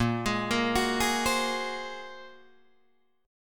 A sharp 7th Suspended 2nd Sharp 5th, down, Guitar, Drop D